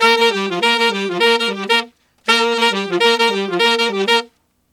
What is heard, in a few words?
Sax Section in Bb, Jazz; Sonic Foundry (Sony Creative Software); Jazz Solos and Sections